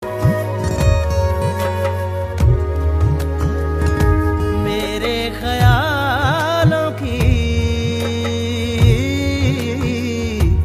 bollwood ringtone

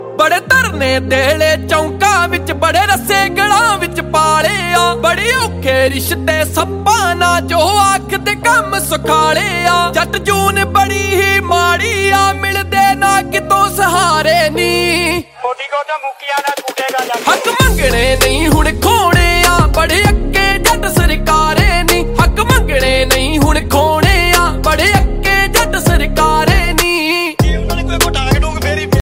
punjabi ringtone

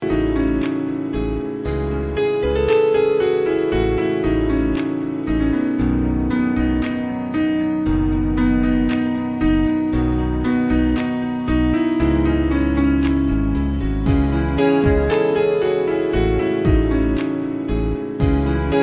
heart touching

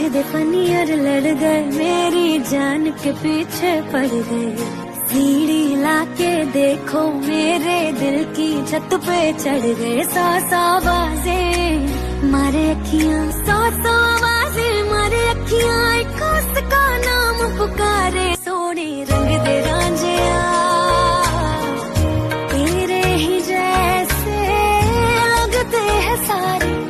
love panjabi ringtone; bollywood ringtones

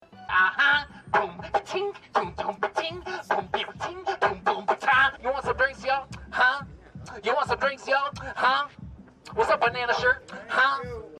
rap ringtone

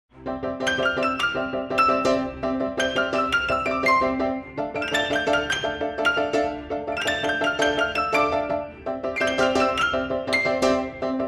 funny beep beep background music tone ringtone

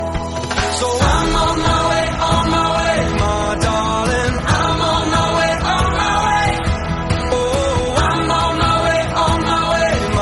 english song tone ringtone

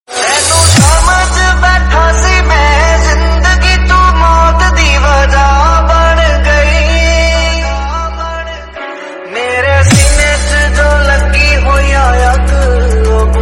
punjabi song tone ringtone; bollywood ringtones

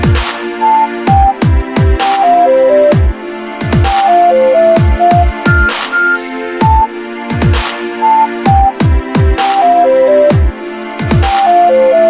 samsung dubstep ringtone

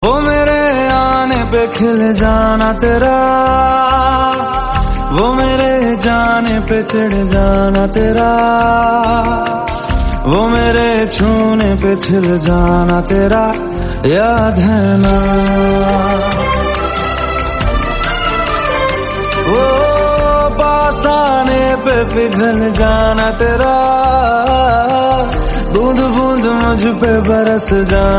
bollywood song tone ringtone

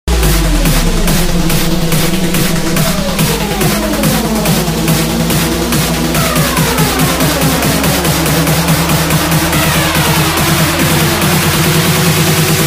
atom bomb trance

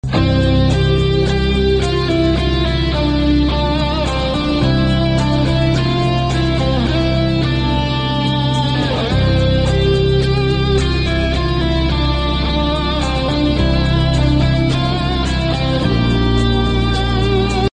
english movie theme tone ringtone; english ringtones

